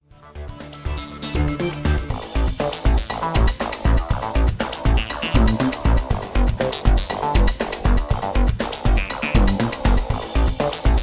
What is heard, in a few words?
Electro Ambient